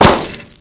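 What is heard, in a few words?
grass; Mafie 1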